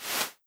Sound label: breaking Dust; voxelverse